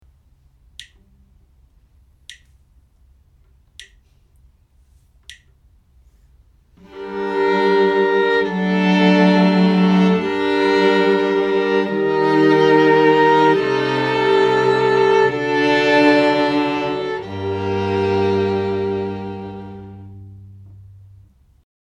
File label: harmonic dictation